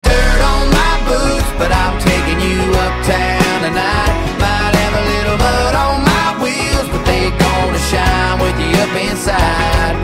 ringtone

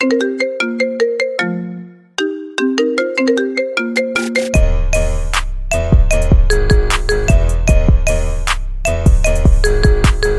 marimba ringtones remix

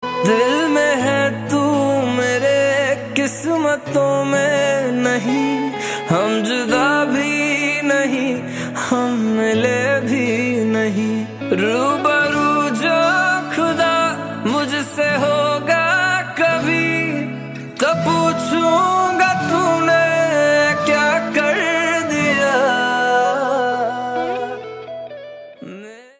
ringtone, hindi songs

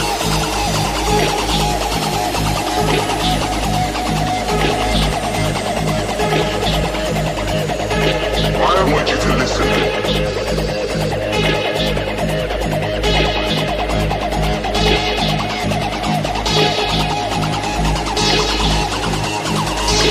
ringtone

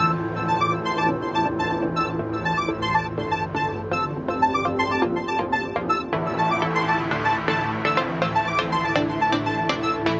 samsung ringtones

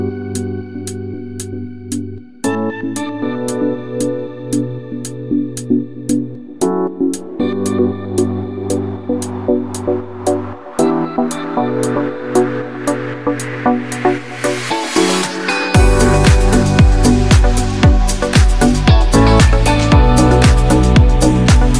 samsung ringtones

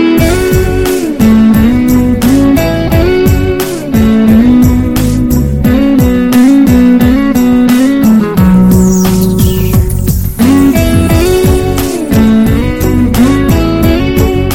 guitar ringtones

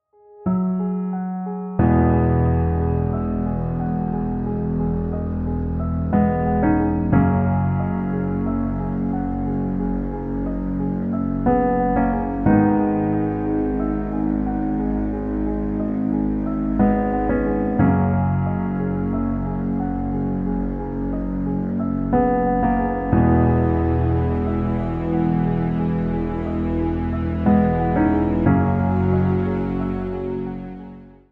sad version; pubg

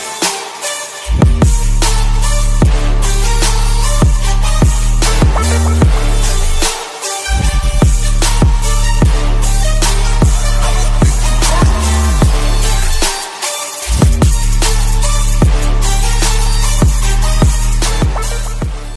instrumental edmstrumental

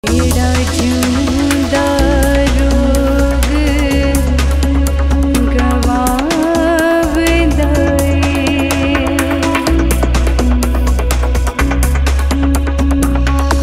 ringtone

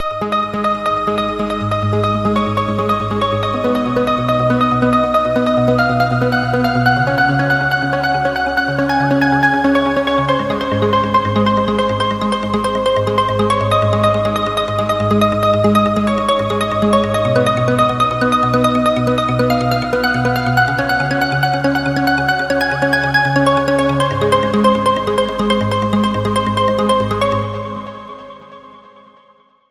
instrumental ringtone

